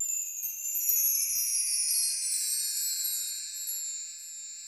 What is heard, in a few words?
WINDCHIME; Crypton - DrumBase5000; COOL PERCS